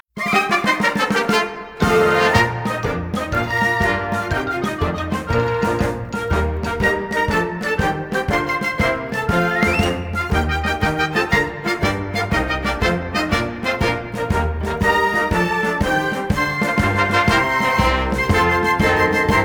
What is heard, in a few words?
Instrumental